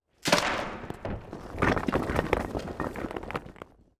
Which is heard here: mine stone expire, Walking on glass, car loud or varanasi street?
mine stone expire